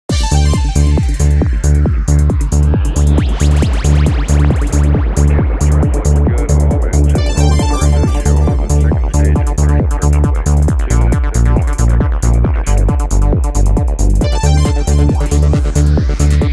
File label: Multiball, Pinball, Music; Pure Pinball